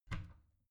bathroom cabinet warped open regular; objekte; tueren

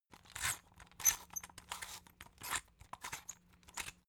salt mill squeak sequence

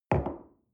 coffee place table soft

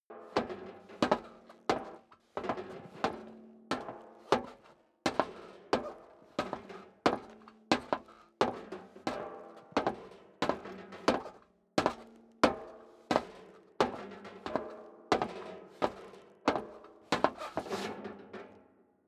step boots metal rattle walk sequence; menschen; schritte

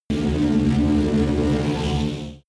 extrinsic buzz, energie

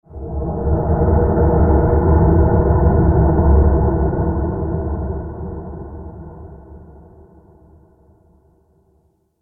breath noisy deep; atmosphaeren

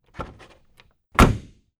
dodge door open close

